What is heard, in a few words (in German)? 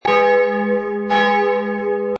glocke es1